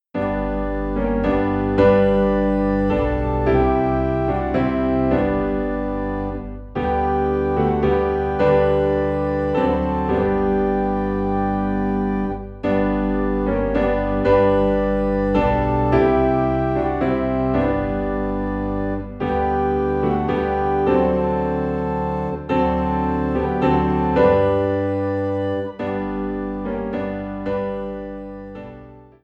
Piano and Organ in G; Jesus I Come

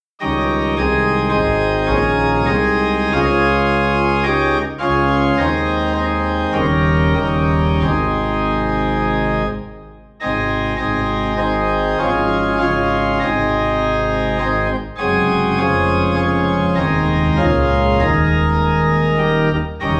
Organ Low in A Flat; Lead On O King Eternal

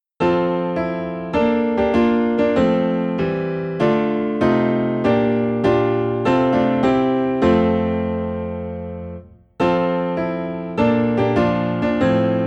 Piano in F; christmas; Go Tell It On the Mountain

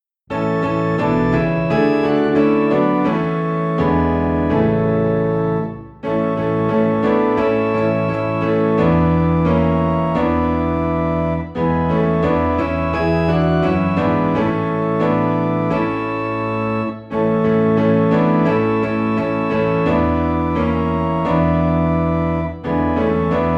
Piano and Organ Low in A; Count Your Blessings

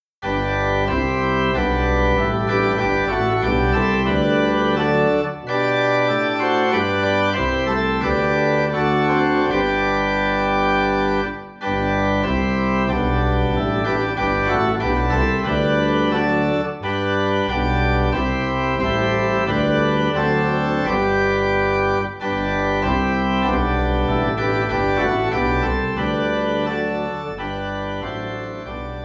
Organ in G; Guide Me O Though Great Jehovah

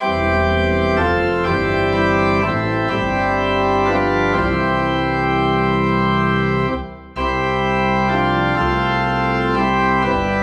Organ Low in C

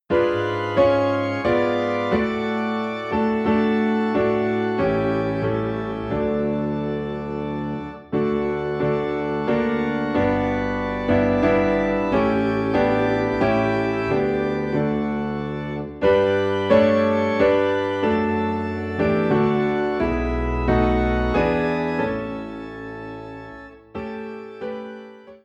Piano and Organ - High in D

